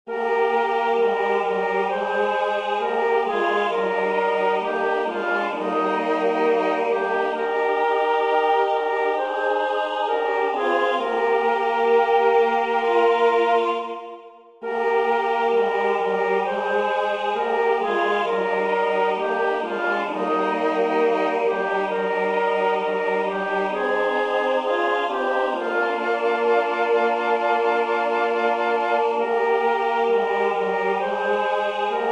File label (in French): ADULTES